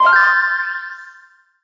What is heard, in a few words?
Collectibles Classic FX; Cymatics; Video Game Sample Pack Vol 1